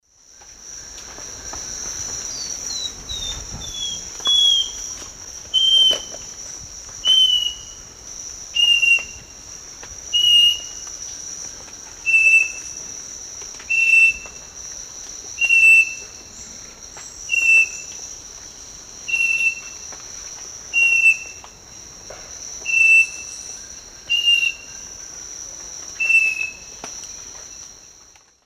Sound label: Microcerculus marginatus, song; S. America; Eastern Lowlands